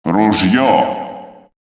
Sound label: Shotgun; vocals ru